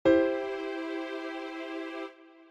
C Maj 1st Inversions

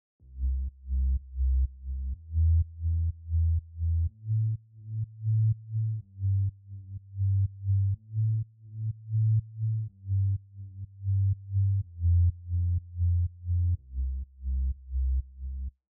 Bassline

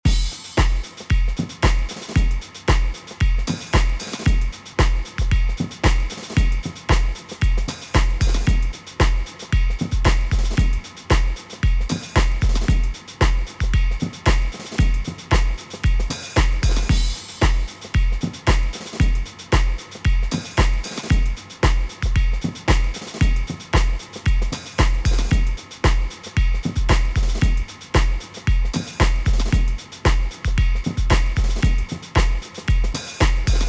Reverb Tails Clashing

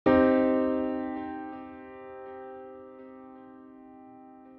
C Minor Triad

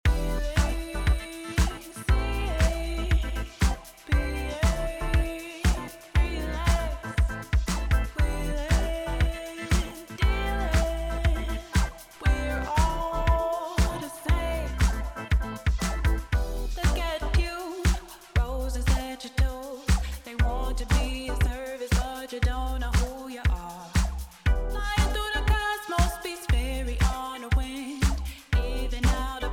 Sidechain Vox to Sub OFF; MB Compression

